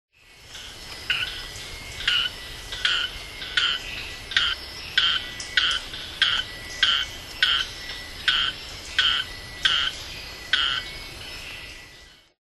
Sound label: Gephyromantis ambohitra